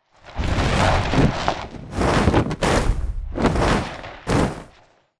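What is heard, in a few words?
flyer dropship attack medium distance; boneyard; Titanfall 2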